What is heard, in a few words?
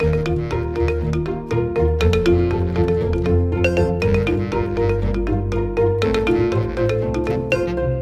classic xylo; music world